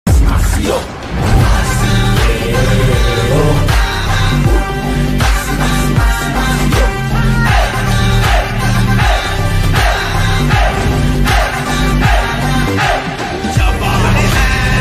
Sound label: climax fight bgm; jawan; srk; atlee